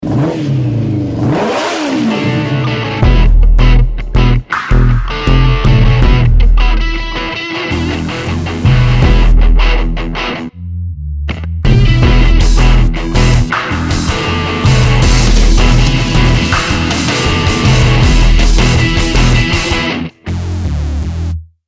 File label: JINGLE ELECTRO ROCK